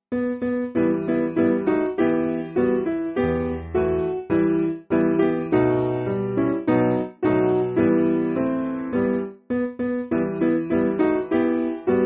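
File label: Piano, Backing Files for Churches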